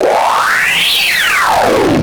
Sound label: quick synthesized phaser noise sweep; swooshes